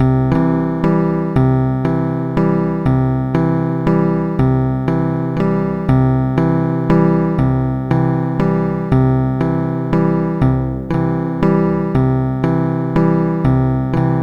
chopin waltz style; SuperJAM!

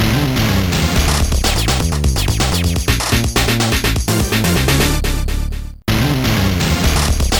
beatbox; pinball dreams; Blaizer

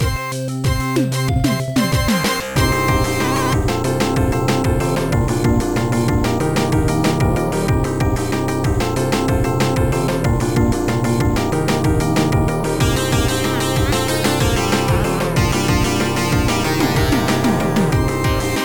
dx7 except drumz

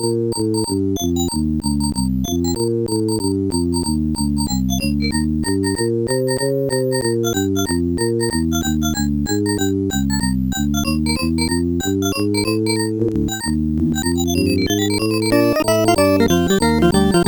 bebop song; The Music Studio

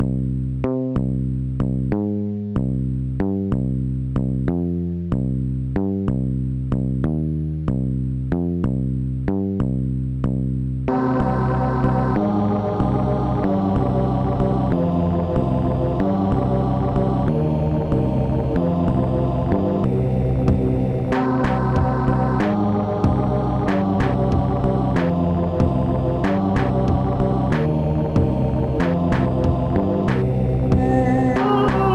Oriental; Soundfactory

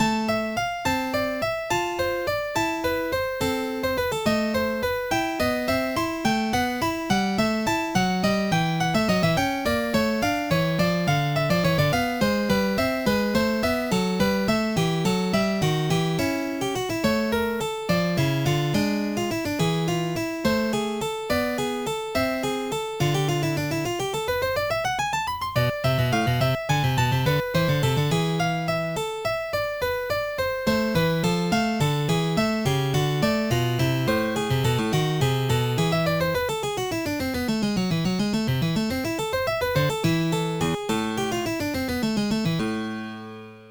prelude; OctaMED MED

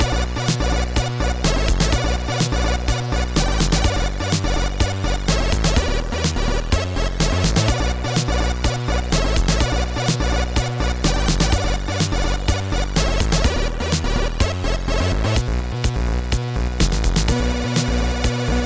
mod funky trip

